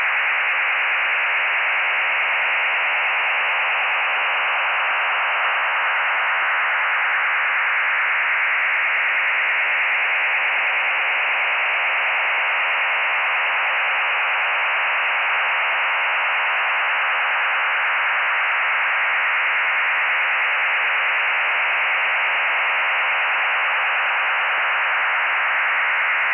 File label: NATO STANAG 4481 PSK WAVEFORM (2400 Bd / STANAG 4285 COMPATIBLE / 300 bps); NATO STANAG 4481 PSK waveform with ITA2 5N1 traffic; PSK-systems